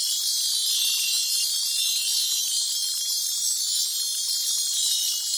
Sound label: skill moonlight loop; agar agar